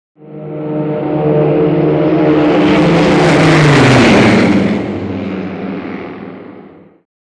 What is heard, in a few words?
Prop Plane Fly By Mosq, mosquito ext fly-by; Mosquito Prop Aircraft Fly Past In Long Shot.